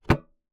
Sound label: Key Noise, Melodica